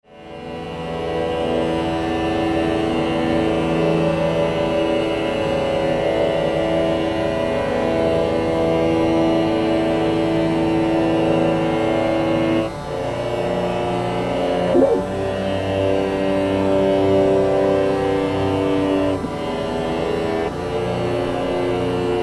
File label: aeolian guitar